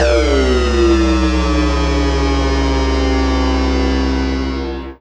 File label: FX - Sync long